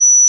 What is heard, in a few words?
G flat 8, pure tones